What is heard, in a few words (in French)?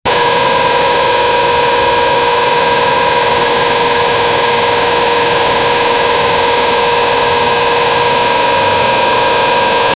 moteur de F1 entendu depu; formula one